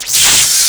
nitro; WhiteOut

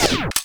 team change; Freestyle Street Soccer; XBOX

